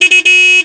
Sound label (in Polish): klakson